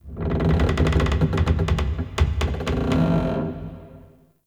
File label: Cave Mine Wood Beam Creak; Ambience; Midrath Island